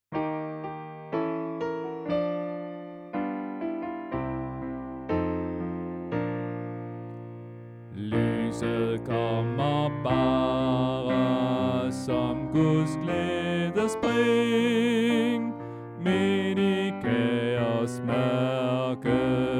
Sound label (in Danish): Bas